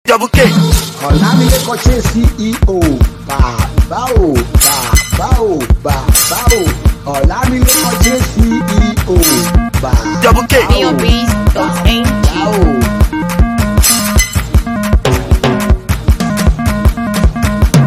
Chase Ceo Beat

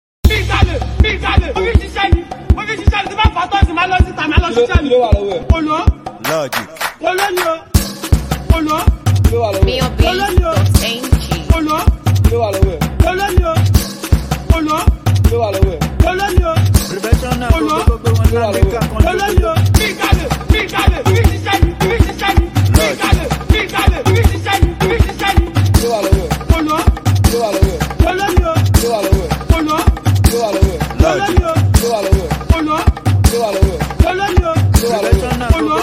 Beat; Nigerian

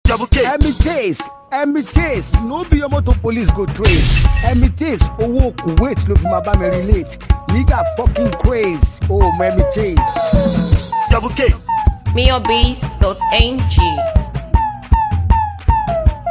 Chase Beat

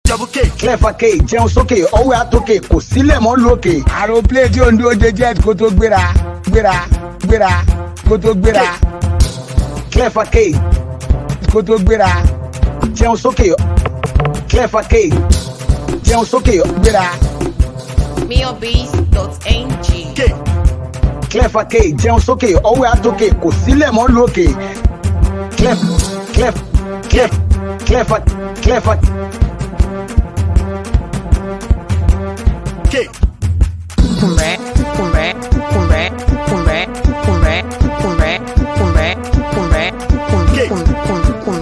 Beat

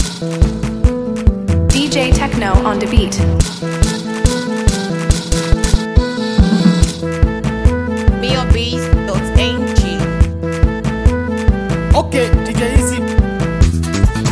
Beat

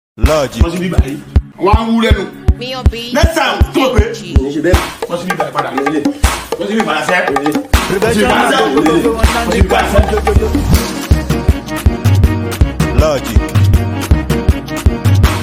Beat Bosibibai Beat

